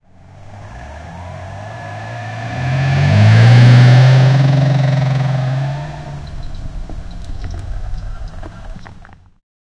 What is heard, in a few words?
a dodge hellcat v8 drives, muscle cars